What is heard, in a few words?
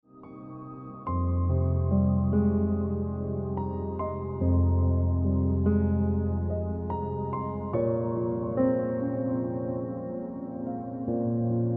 Piano Music